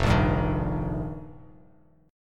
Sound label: F 9th Suspended 4th, Bass Standard; strummed; chord; F9sus4; 1,1,5,8; JGuitar